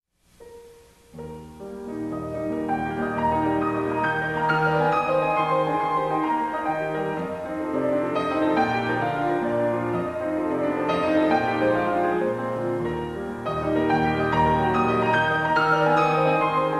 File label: Prelude in Eb Major Vivace